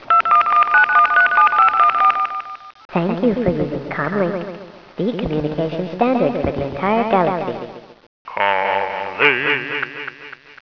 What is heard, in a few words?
Comlink Service; WARLOCKSNDS